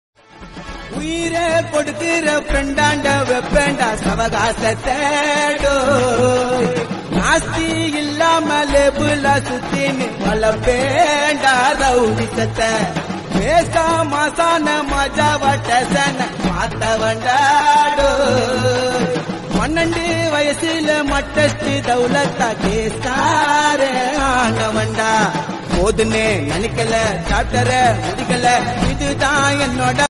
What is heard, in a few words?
Tamil South Indian